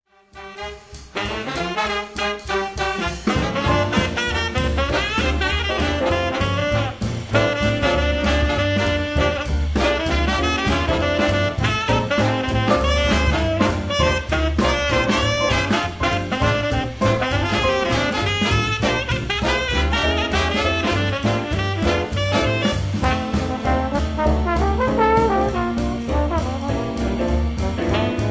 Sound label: Swing 197bpm; teaching listening